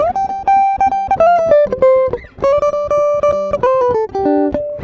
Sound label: Acoustic Guitar 99 bpm G, African Guitars